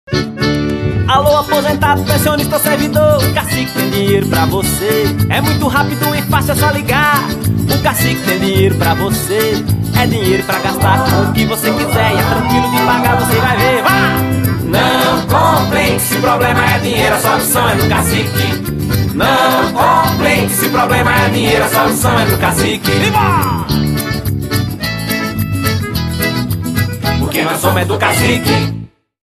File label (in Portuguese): Forro; Banco Cacique